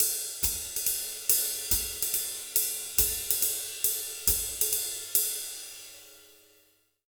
Jazz Latin Drumloops; USB Soundscan vol.08; AKAI

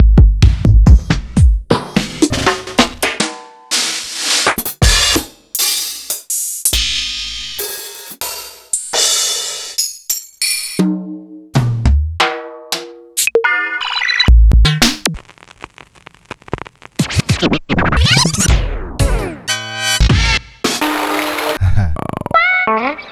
Kit; proteusvx

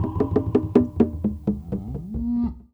WildFX, African; Quazibeat; African Secret; SFX

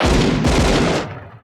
SHOT SEQ; Total Control - Action Cutz

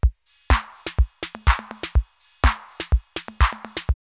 808 House 124, drumloops, DrumMachines; X-Static Goldmine

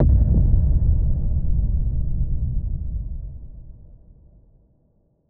Impact

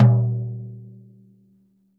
floor tom - snares off; JazzFunkKit

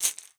MARACAS; Xsample VOL-9

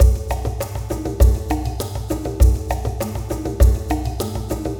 Cave Ceremony, percussion

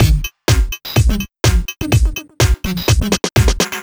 Drum no synth, Drum Loops, 125 BPM; Sonic Foundry (Sony Creative Software); Underground UK House & Electro

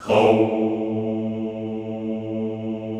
HOUUU, Classical Choir

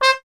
TP C; Miroslav Vitous - Solo Instruments VOL-1; Trumpet

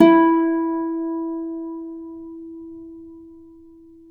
Celtic Harp, Plucked Strings

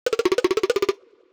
Running, Classic SFX, cartoon; musicradar; cartoon caper

